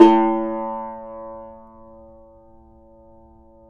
yayli tambur

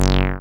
TB G2; Best Service Gigapack 1; SYNTB303

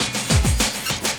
loop, 200 BPM; Ueberschall; Drum & Bass Resonance

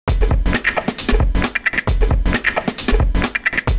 whawha n drm; Univers Sons - Kitch 70s

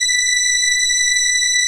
ELKA, String Machines; ELKA RHAP

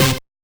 THIN CORE, RAVIN HITS; Spectrasonic Distorted Reality 1

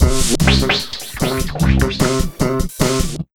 150, Big Beat Drumloops

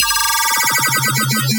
Bass Layer

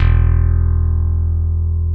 E1 F.BASS; Keith Emerson Signature Series

